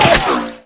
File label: bullet impact